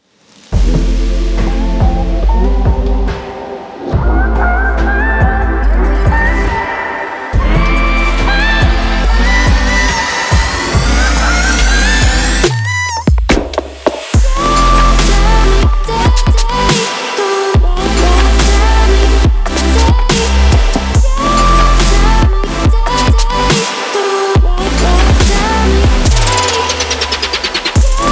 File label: dubstep future bass